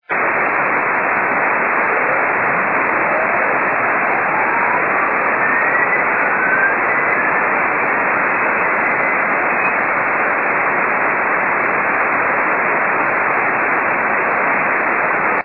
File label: aieplane; WSJT/JT65C; 横浜市; 茅ヶ崎市; 曇、気温5℃ 湿度80％; 飛行機・機体反射：羽田空港上空; 伝播距離：50km; ドプラー音